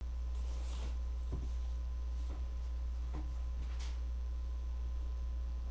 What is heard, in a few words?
cry